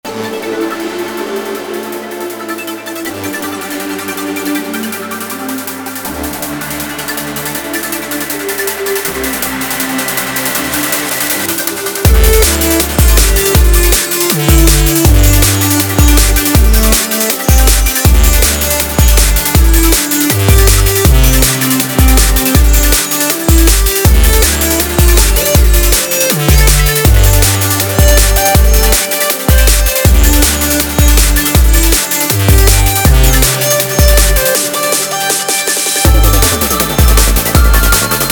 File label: drum n bass